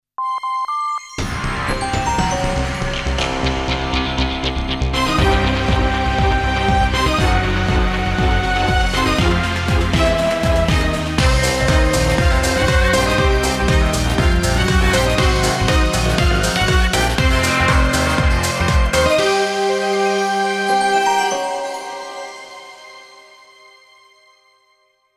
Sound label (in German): klingelton; polizeiruf 110